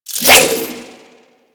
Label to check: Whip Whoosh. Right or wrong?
Right